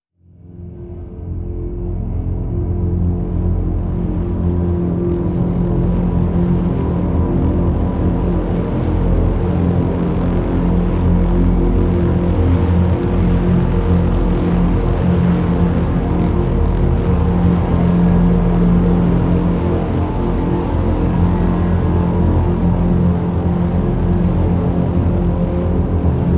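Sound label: Drn F, drone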